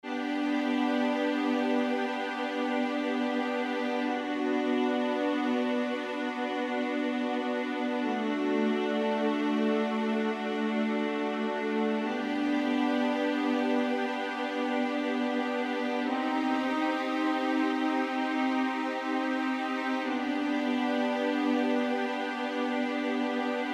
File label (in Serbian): akordi gudaci dur